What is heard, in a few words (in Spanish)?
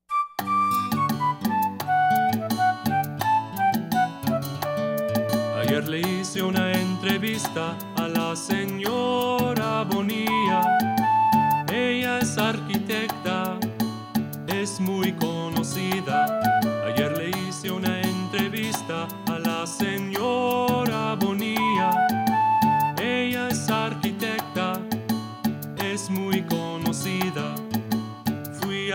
La Entrevista (Vocals)